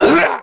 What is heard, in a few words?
squish; Crypt of the NecroDancer